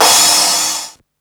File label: Crash, Ensoniq Mirage drum machine